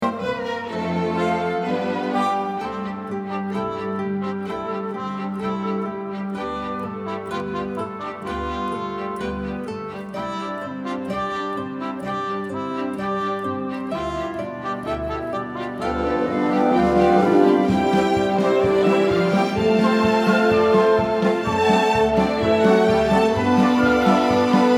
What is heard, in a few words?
ACCOMPANIMENT TRACK; theater auditions